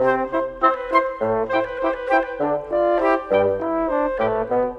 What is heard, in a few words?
Brass Winds; Sonic Foundry (Sony Creative Software); Orchestral Series VOL-3; Cinematic 26